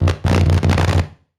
Technology Electronic Electric Arch W Verb Single

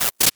Title Transition Chopper; Production Element Title Transition

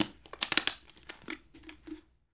Tupperware Movements, Household

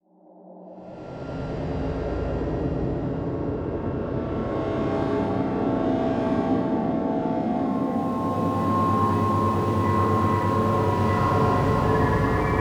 Alien Movie Trailer; AdobeCC; Imaging Elements; Module Production Element Title Imaging

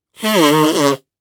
Human Male Nose Blow

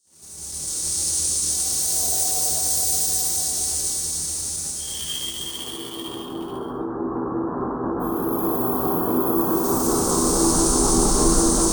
Ambient Spooky; Module Production Element Title Imaging; Imaging Elements

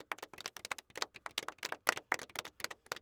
Human Crowd Noises Golf Clapping

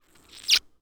Human Male Kisses Cheek

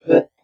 Multimedia Internet CD-Rom Flash Beep Whoosh

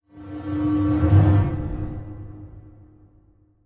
Transition Whoosh Fire; Production Element; Title Transition; AdobeCC

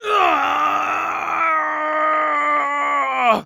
Human Male Groan In Agony

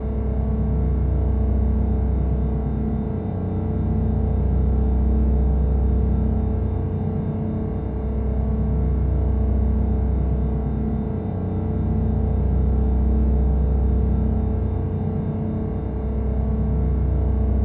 Drone Synth Square Wave Tone; Production Element Imaging; AdobeCC

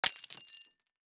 Wine Glass Drop And Break; Crashes

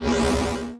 Science Fiction Sci-Fi Electronic Teleporting

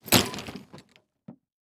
Sports Bag Golf Bag Dropping On Cement

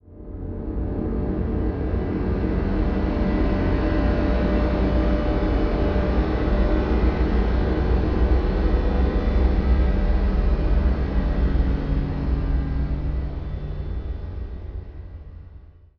Suspense; Imaging Elements; Module Production Element Title Imaging

